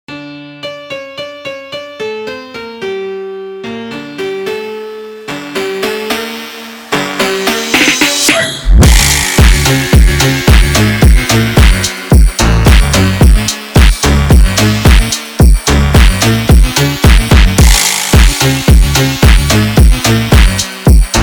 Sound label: Classical Phonk slowed Reverb